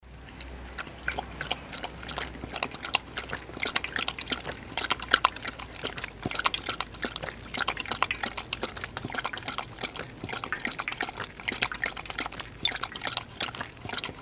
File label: thirsty sheepdog drinks water after working